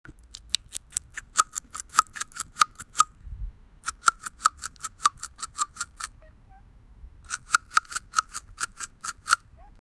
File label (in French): une pastque le fruit se